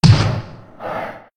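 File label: giant elk damage; monster; Senillinea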